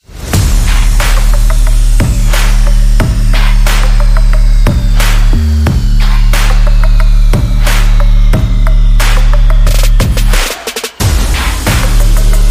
Bass Boss

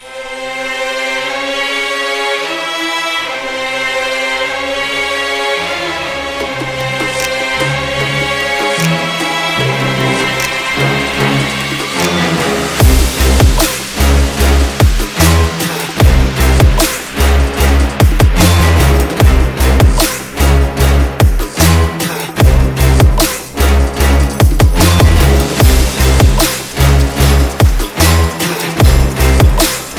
Arabian Trap

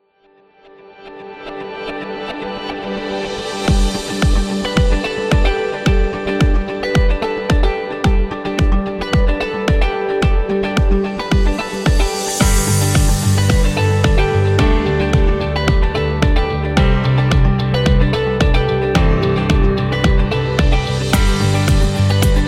Medical Background

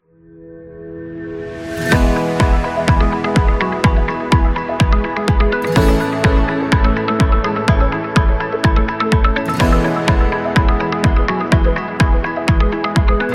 Upbeat Corporate; corporation

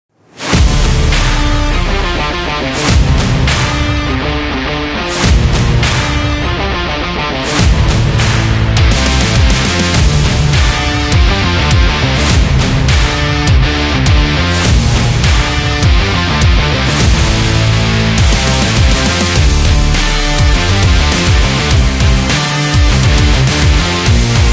Rock Opener

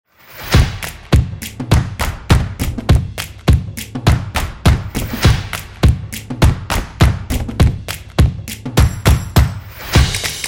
This Is Claps

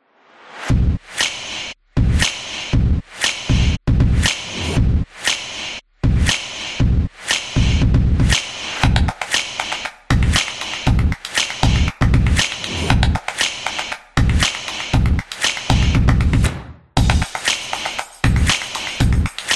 Upbeat Bass; fast tempo

